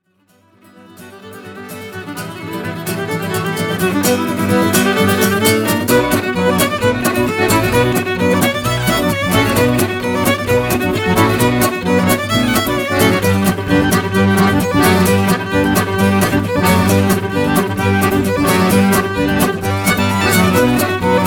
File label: Traditional Folk Band; London